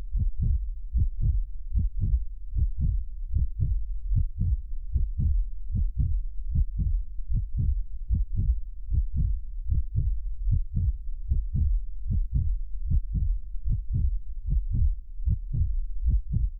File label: Heartbeat Slow; Vocals & Wallas